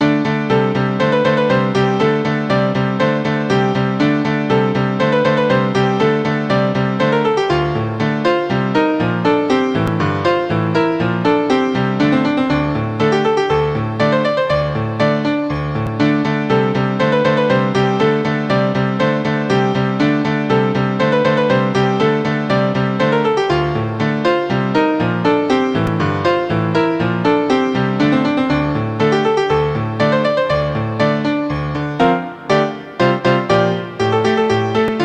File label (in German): klavier